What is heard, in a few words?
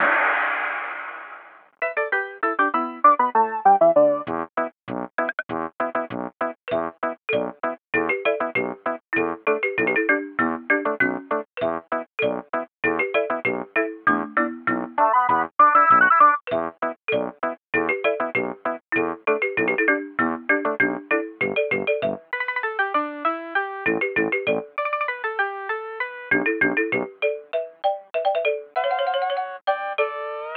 Aircheck, Phono